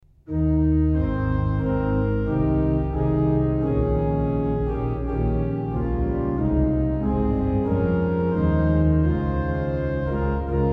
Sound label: hymn